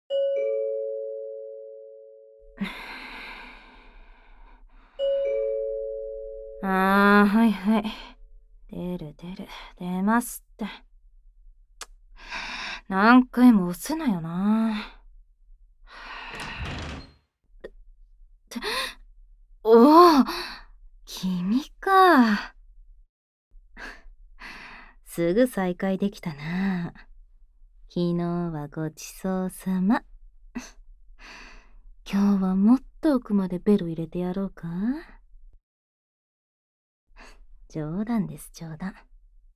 背面耳舐め手コキ; 四つん這いで屈服背面耳舐め手コキ

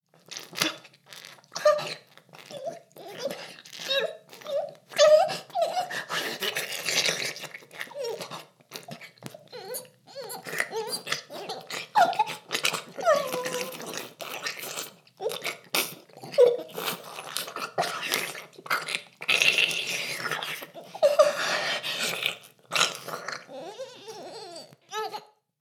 フェラ音声; ASMR; ダメなメイド

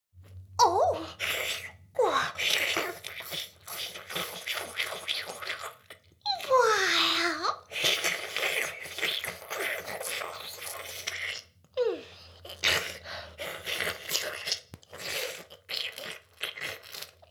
フェラ音声; ASMR; 魔法少女マスカリマッスル

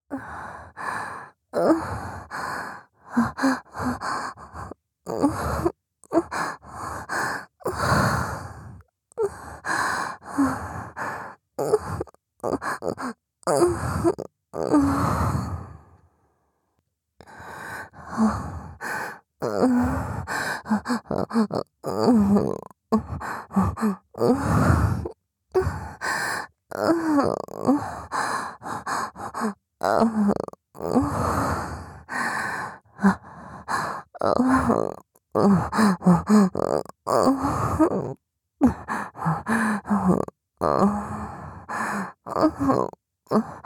チン嗅ぎと裏筋舐め上げ 左 喘ぎ; ASMR

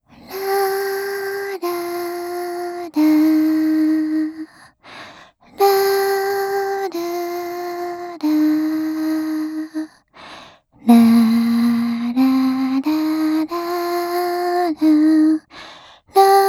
子守唄 リバーブなし; 楽曲

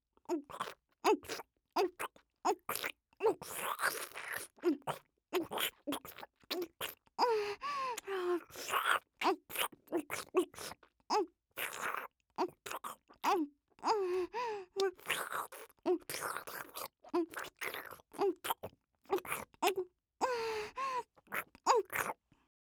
フェラ（ディープ）; ASMR; お姉さんのフェラ（ディープ）; ループ音声; 僕とお姉さんの音声

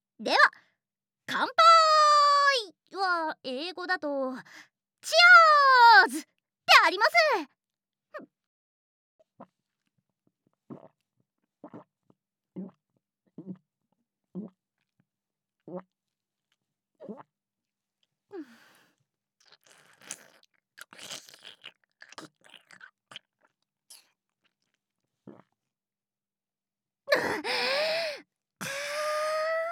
乾杯 ザーメン ゲップ; ザーメンゲップ集

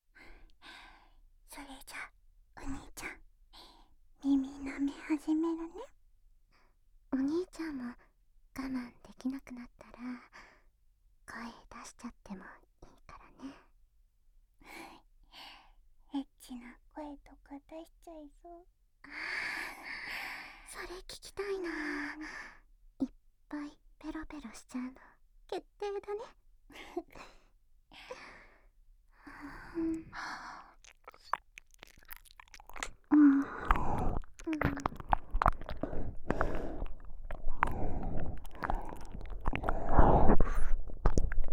左右で両耳同時に耳舐め; 義妹が左右で両耳同時に耳舐め; ASMR

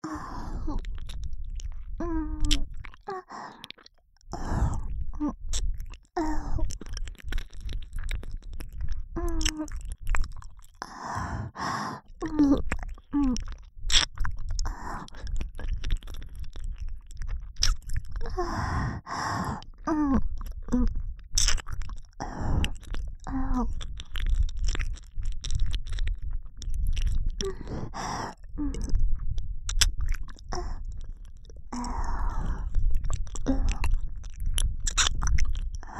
左耳舐めループ 耳塞ぎ; 耳塞ぎ舐めver

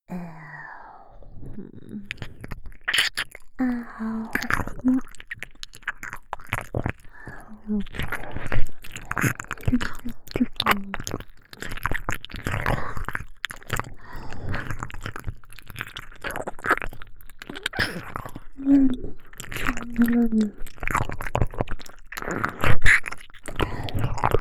耳舐めループ(姉妹HELL); 難易度HELL

